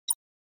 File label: Holigraphic UI Sounds